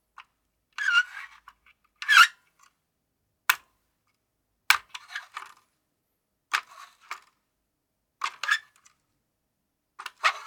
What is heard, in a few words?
Screwdriver Big